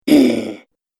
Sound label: Goblin Annoyed, monster; Monster Sound Effects; horror sound effects